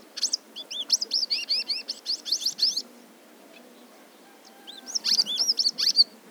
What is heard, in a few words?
indigobird